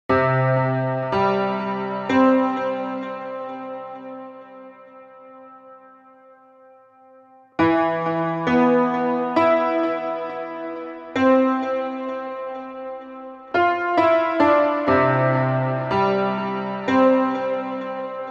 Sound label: Sad desire music